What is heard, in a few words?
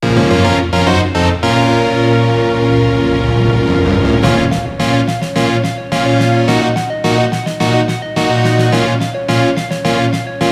Fail; Ryu ga Gotoku 7: Hikari to Yami no Yukue